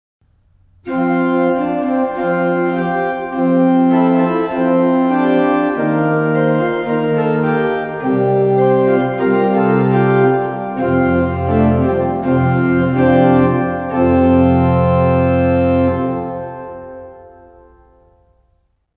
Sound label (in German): orgelvorspiel; Hörbeispiel "Nun danket all und bringet Ehr" GL 267 / GG 403